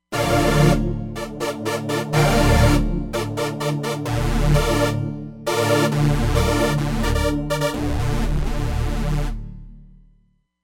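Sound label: Saw Stac Chord, Roland Fantom XR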